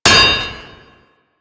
Worker Hammer Impact Metal, weapons

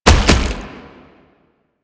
Gallop A, Steps; Enemies; Proto Infested Brachiolyst; Warframe

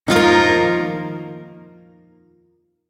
Shawzin Blues Chord; Warframe; Duviri Shawzin

